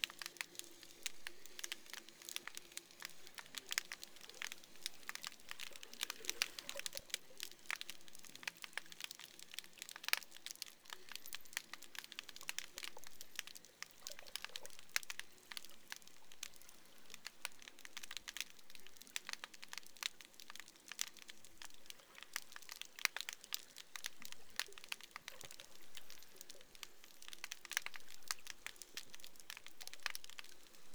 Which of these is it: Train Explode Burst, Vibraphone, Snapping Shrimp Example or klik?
Snapping Shrimp Example